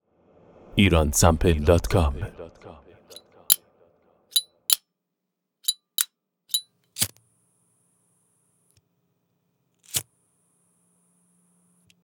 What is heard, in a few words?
Lighter; Iransample